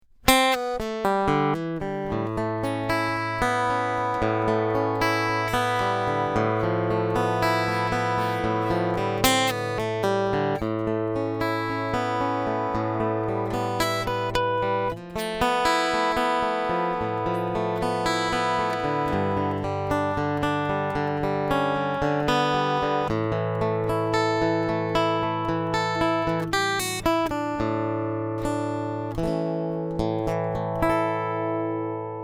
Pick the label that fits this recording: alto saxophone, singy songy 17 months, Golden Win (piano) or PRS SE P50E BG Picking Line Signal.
PRS SE P50E BG Picking Line Signal